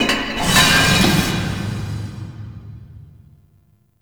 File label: Loot Room Open; Warframe; Ambience; Entrati; Dead End Two